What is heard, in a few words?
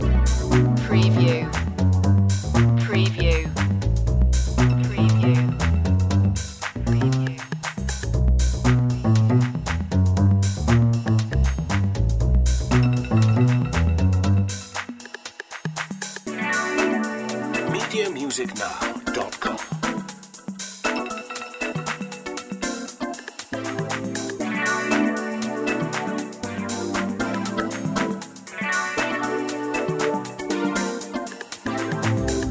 groove set loop